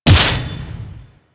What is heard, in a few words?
Hammer Glass Impact Default E; Warframe; Weapons